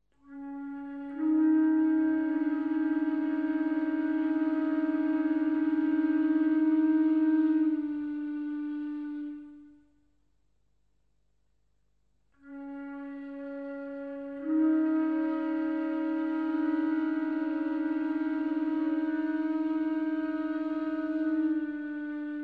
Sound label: trio for flutes